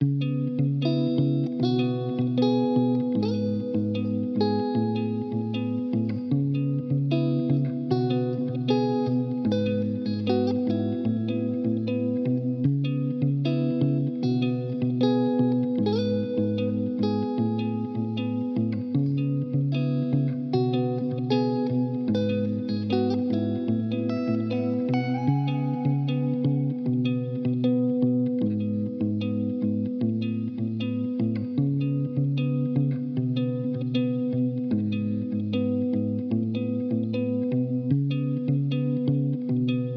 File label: 152bpm C Min